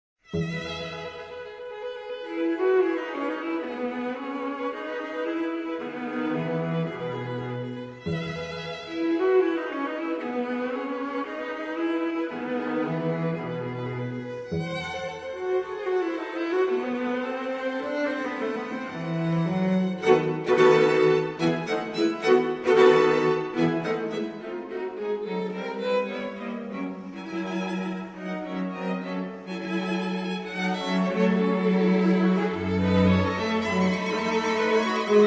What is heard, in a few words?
string quartet e dur; String Quartet č.1,E dur,op.15, 1.věta; Stamicova kvarteta; Supraphon